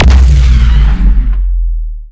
lazer fire; minecraft

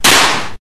bullet silence; minecraft

